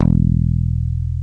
ELECTRC BASS; OMI - Universe of Sounds

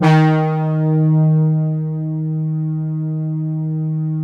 HORN, MATRIX 12; Big Fish Audio - First Call Vintage Keyboards

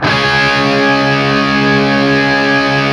5TH C#3, guitar; 5THS LOOPED; East West; Steve Stevens Guitar Samples Collection

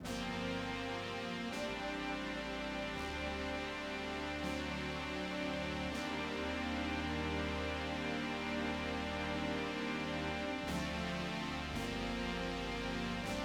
synth 3 B, Smooth RnB; 082 Gmin; Big Fish Audio; Legendary; Shes Mine

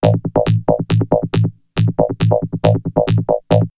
Vocoder Loop; X-Static Goldmine; VocoderLoops

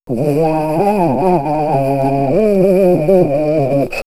Ethno Vox; Tangayika; X-Static Goldmine; vox mix

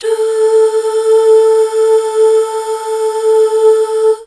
DUUH, BACKGROUND

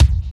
KICK, Tekno Industrial; East West

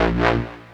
DAGGARACINGBASS

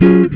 GTR CHORD; Univers Sons; Jazzistic

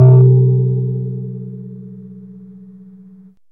GAMELAN; Zero-G Ambient 1

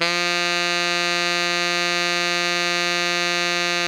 TENOR FF F3, Saxophones; Greytsounds; Philip Wolfe David Crozier's Saxophones; TENOR SWITCH

